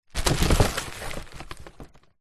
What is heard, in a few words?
foley plastic packaging into cardboard box; zapsplat